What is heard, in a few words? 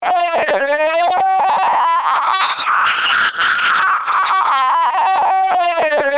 siren